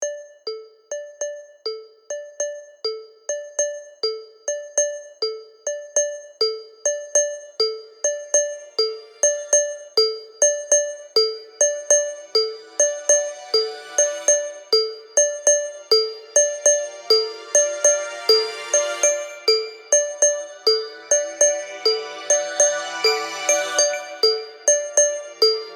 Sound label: alert; nadeshiko pink; Sailfish Ringtones